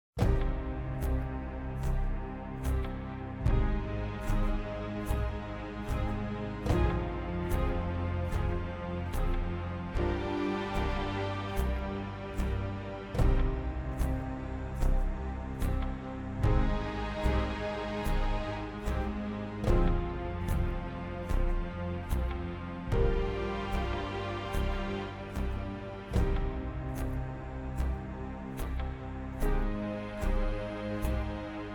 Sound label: Cinematic Suspense Atmospheric; amperAI